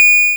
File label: pickup